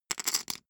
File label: poker chip stack small rattle